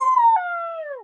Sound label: Dog Howl; Playable Tutorial Level